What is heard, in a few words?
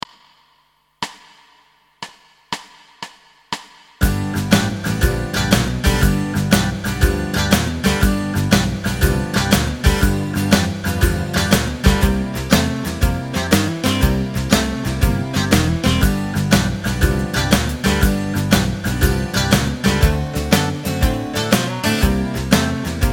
Riffs G